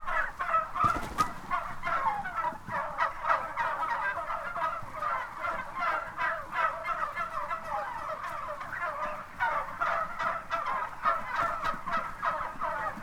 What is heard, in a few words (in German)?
Putenfarm 15 Wochen alt Stall Atmo; Dattenhausen; 24-Bit 192 kHz